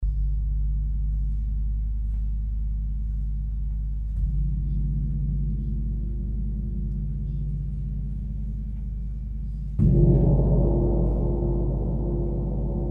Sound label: Gong